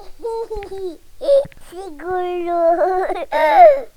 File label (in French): rire